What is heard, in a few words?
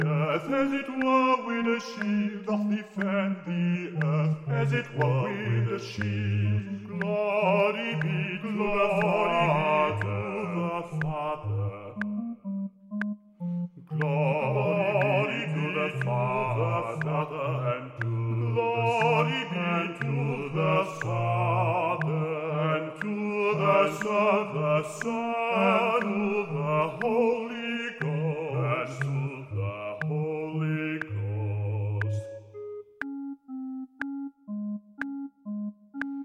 Rehearsal B1 B2; Gibbons